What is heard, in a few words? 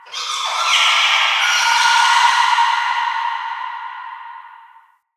Root Cave Distant Chatter; Ambience; Neathuns Root Cave